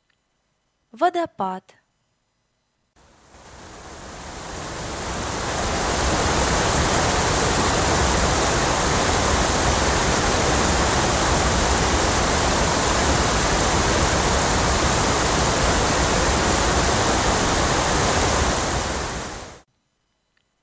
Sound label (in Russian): Водопад